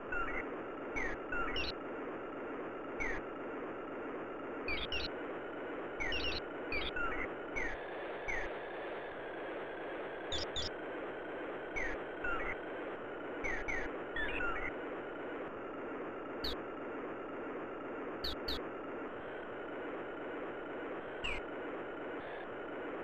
Atmos

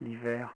Whisp; Revista CD Expert 50

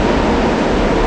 wind cou1

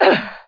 land; Daikatana; mikiko

